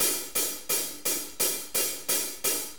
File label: 4-4 HiHat Loop; Drum Tools; 4-4 Drum Loops; HiHat & Ride Loops